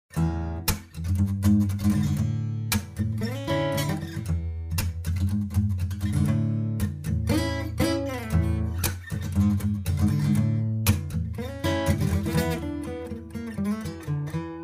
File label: Bard Blues